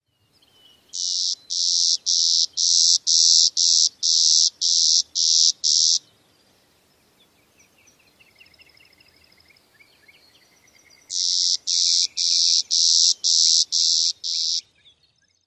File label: many-buzz songs